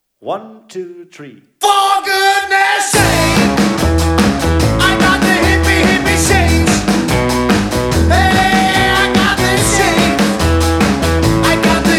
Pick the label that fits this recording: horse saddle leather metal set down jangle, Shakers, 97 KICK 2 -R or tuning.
Shakers